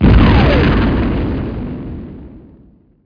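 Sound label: sound explosion; MOTS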